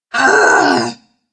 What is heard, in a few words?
pain